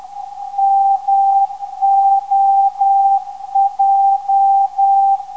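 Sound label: non-directional beacon; MOJ; 308kHz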